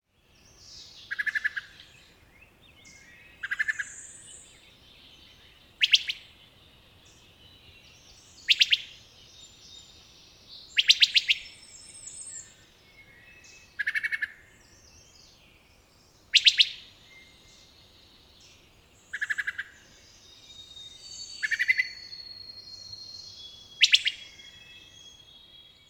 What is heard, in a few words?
wood thrush bup pit volleys, NY